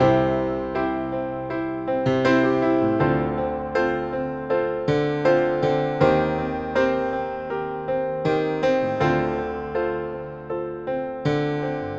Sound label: 70s Ballad Piano